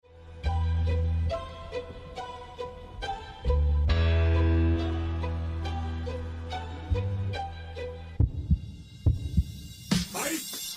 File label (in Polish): rap podkład